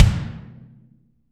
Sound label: BD, Real Mega Drums; Best Service; Real Mega Drums VOL-1